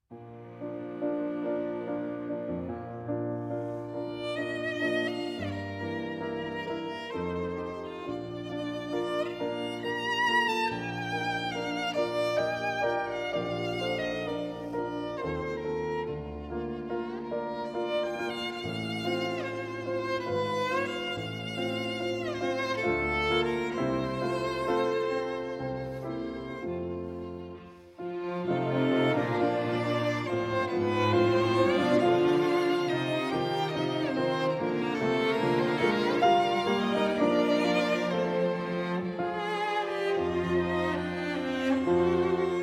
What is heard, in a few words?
Piano Quintet in G Minor, Romanza - Andantino Con Moto